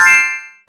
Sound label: unlock